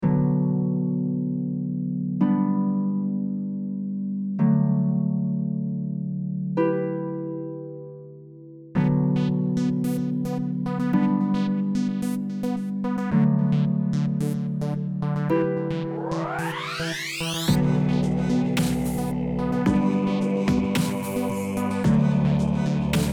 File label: bass prayer